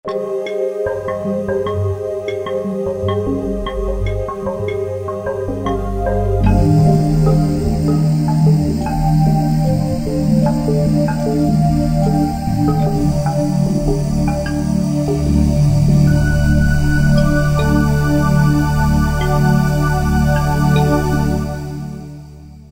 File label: Fx-Hot Spring, Yamaha EX5R; Factory presets